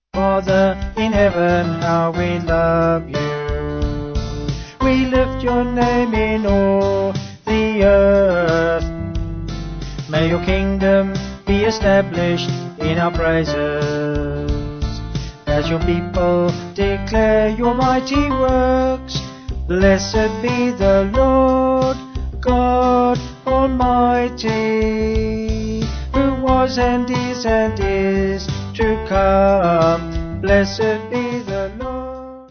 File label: Vocals Band